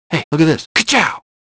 Pause Options Dialogue Loop; Cars; XBOX

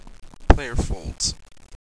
fold, poker

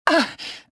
jump; mothma

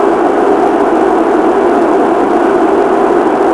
Large Crowd Boo; David Beckham Soccer; XBOX

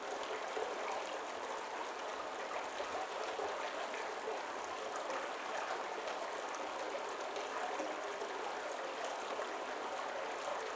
cave waterflow; Star Wars Obi Wan; XBOX

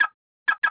Alarm, Ambience; Project Gotham Racing 2

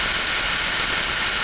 loop, engine; zafir; sphinx

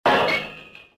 gate stop, fence gate; movers; doors; Soldier Of Fortune II Double Helix; XBOX

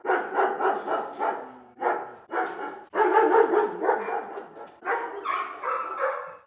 dog; Virtual Pool Tournament Edition

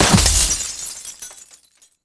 kick sign

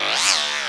button

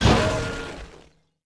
manhole Shatter; impacts explos

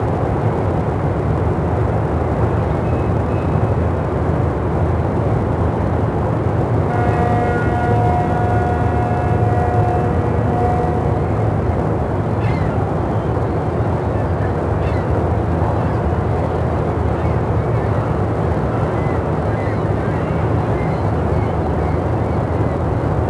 Docks, noise; Max Payne; XBOX

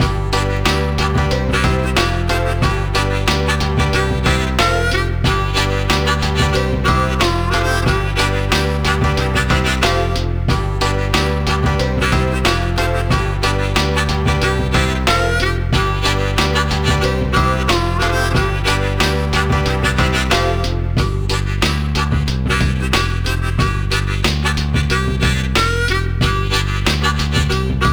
Music; Tombstone Regular; XBOX; AMF Extreme Bowling 2006

